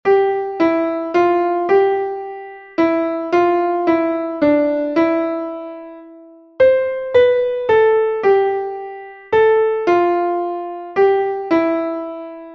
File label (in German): klavier; MelodieMeister